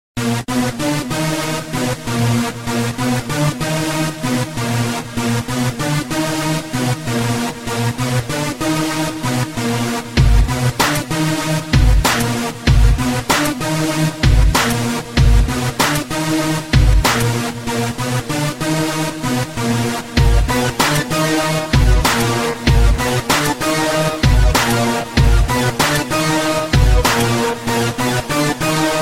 Gangsta Beat

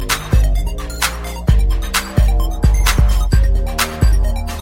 Cool Sms Tone

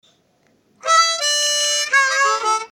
Blues harp

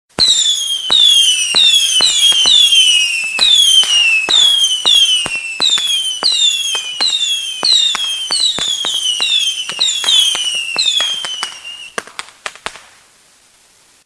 Diwali Firecrackers

